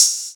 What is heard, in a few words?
sharp open hat F key, Royality free open high-hat sound tuned to the F note. Loudest frequency: 6406Hz